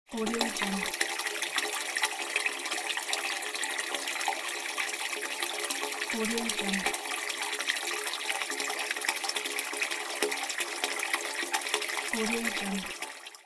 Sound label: stream of water in tube; warpjumper